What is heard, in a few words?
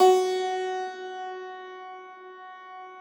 Thumb Tack Piano ff ped; Total Piano